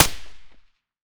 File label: m4a1 silencer mono distant, weapons